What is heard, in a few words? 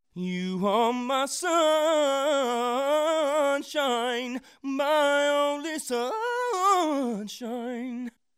vowel modification in R and B